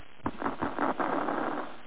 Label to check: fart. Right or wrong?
Wrong